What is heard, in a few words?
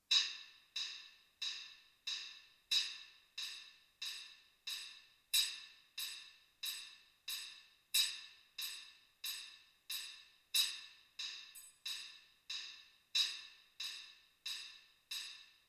theme from symphony no. 9 triangle